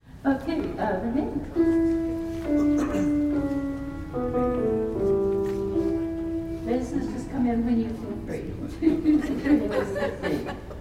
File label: Magnificat tenor